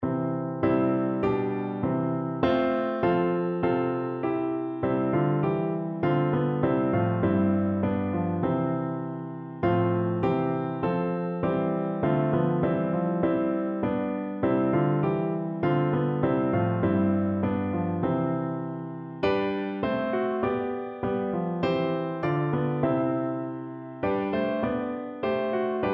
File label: jesus christ is risen, piano